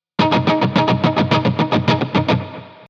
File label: brushing